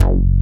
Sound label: low end bass; Dance; BASS SOUNDS; Masterbits; Dance Trax